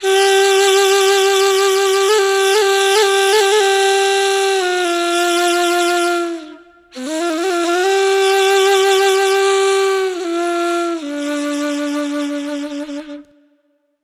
Ney, Wind Instruments

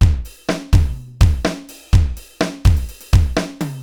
Akai XE8 (125BPM), beats

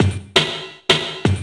LOOP, 160 BPM, Drum & Bass

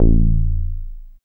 Bass SH-101

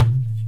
INDIAN PERC

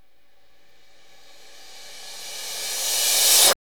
CYMBALS

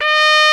TR2 G#4, trumpets; East West - Global Sounds

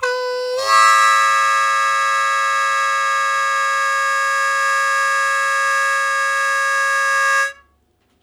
Blues Chord Attack A1, Harmonica; Zero G - Harmonica

